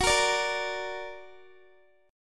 Gb Augmented Guitar; Listen to Gb+ strummed

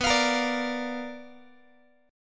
B Minor Major 7th Flat 5th Guitar; strummed; JGuitar

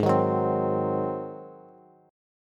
G sharp 7th, Bass; strummed; chord; JGuitar

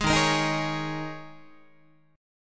Eb 7th Suspended 4th Guitar, Eb7sus4 chord; strummed; 11,11,13,13,12,12; JGuitar

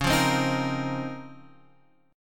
Db Major 13th, Guitar Open F; strummed

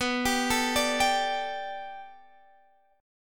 B Minor 7th Sharp 5th B Guitar DADGAD, chord

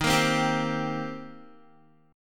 Eb 6th Eb down Guitar; strummed

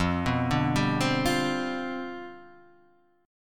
C Major 7th Suspended 2nd Suspended 4th F, chord

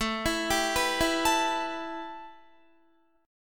A 7th Suspended 2nd Guitar; A7sus2; arpeggiated

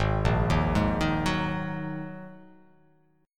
Ab 7th Suspended 2nd; Ab7sus2; arpeggiated; chordshape; 4,1,1,1,3,1,x,x; JGuitar

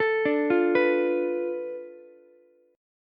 B Minor 7th Flat 5th B Ukulele; Bm7b5; arpeggiated; JGuitar

